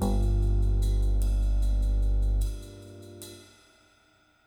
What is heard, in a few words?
Simple Bass; Solos